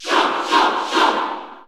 Cheer English; Shulk; SSBU

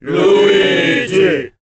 Cheer Korean; Luigi; SSBB

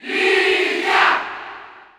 Cheer French; Lucas; SSBU

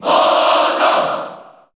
Cheer Japanese; Morton; SSB4; SSBU

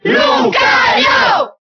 Cheer Italian; Lucario; SSBB

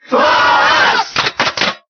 Cheer Italian; Fox; SSBB

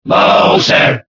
Cheer Spanish; Bowser; SSBB

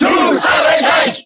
Cheer French; Ike; SSBB

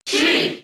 Cheer English; Sheik; SSBB

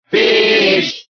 Cheer Spanish; Peach; SSBB

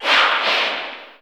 Cheer English; Pac-Man; SSB4 SSBU

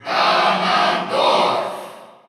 Cheer Russian; Ganondorf; SSBU

